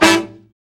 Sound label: BRA SECT; BRA SECTIONS